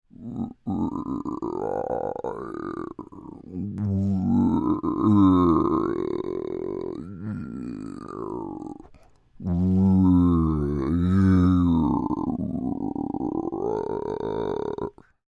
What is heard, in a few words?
Creature Groan Throat Rumble, Human; Groaning; Voice; Creature,Human,Groan,Throat Rumble